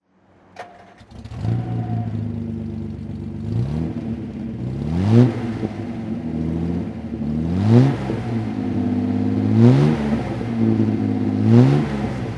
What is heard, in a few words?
austin healey 3000 mk2 throttle